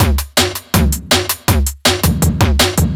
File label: Old School Break, Drums